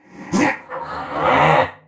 Wound; Deathbringer Saurfang

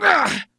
wound; Mage Lord Urom

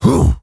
Stonebark Attack; Elder Stonebark

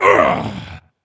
Wound; Captain Rupert

